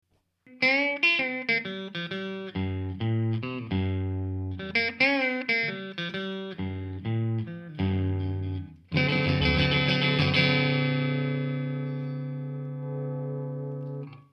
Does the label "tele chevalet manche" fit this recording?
Yes